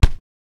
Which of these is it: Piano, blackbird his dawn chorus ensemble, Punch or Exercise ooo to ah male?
Punch